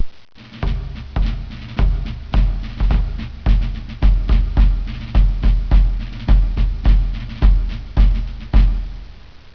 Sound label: DRUMS